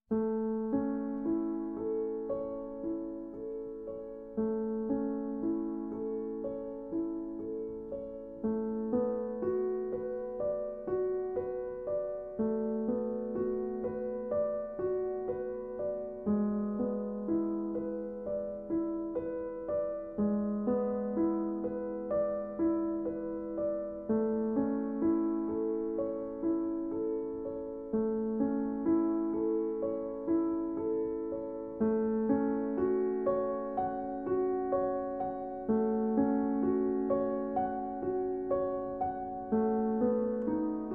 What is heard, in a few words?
Prelude and Fugue in C Major, BWV 846 I. Prelude